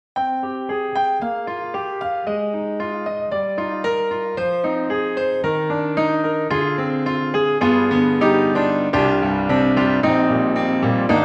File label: Grand Rhapsody Piano, Studio B; Waves